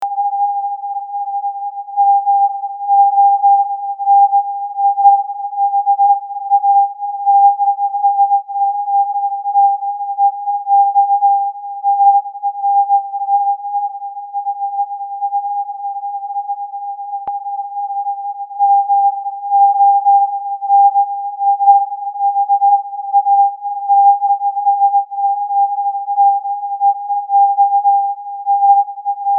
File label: partial cw very narrow; transatlantic